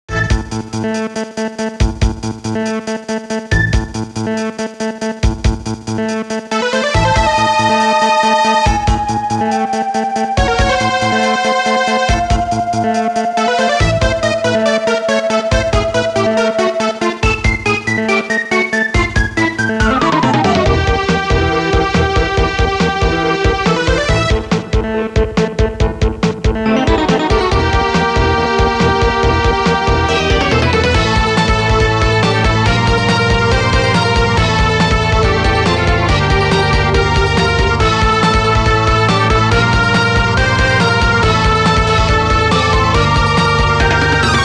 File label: Retro; Dragon Ball FighterZ